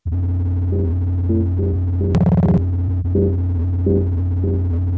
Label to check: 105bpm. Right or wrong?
Right